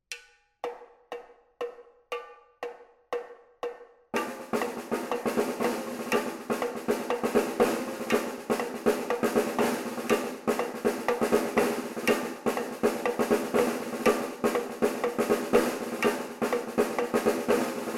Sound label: Caixa, marcha